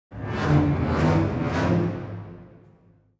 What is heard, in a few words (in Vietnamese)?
Am thanh nguy hiem tung buoc